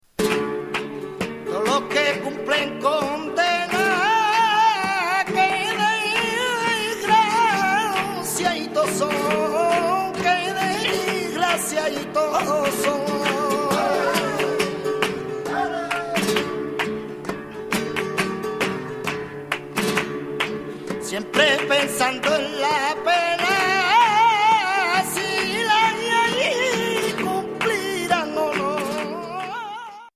tango

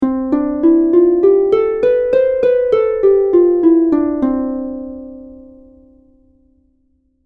arpa

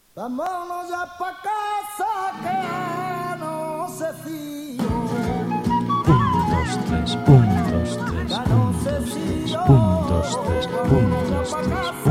sevillana

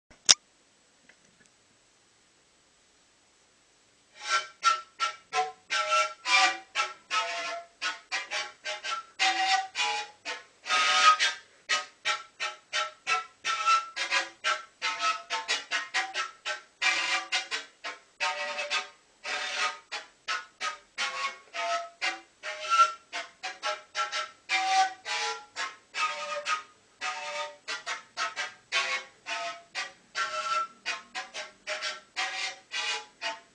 siku huayno; Ojos azules (huayno)

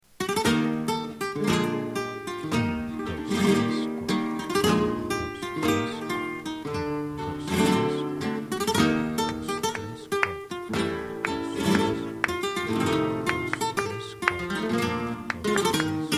tiento

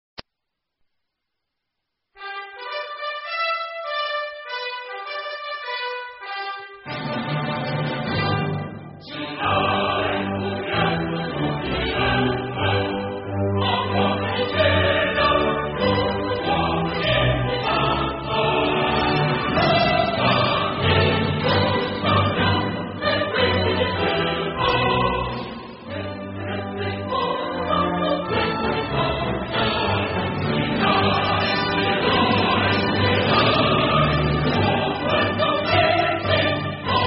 himno china

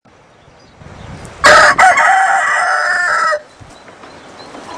kikiriki

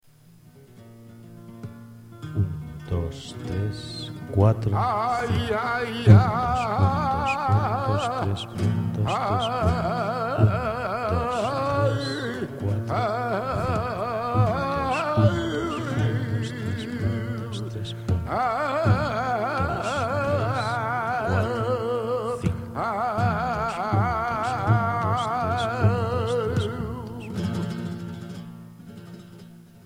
seguiriya

